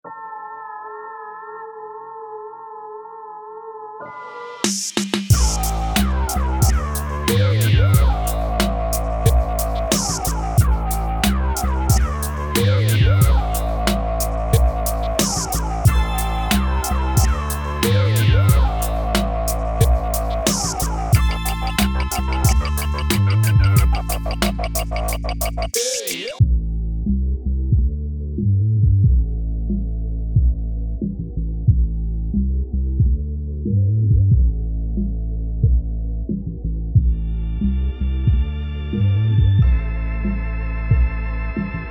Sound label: all around hip hop